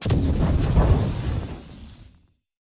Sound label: action chem ignite